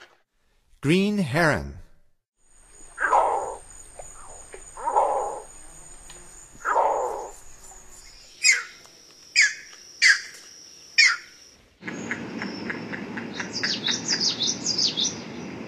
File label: Green Heron; North America; Stokes